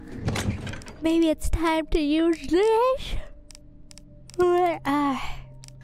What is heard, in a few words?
Yawn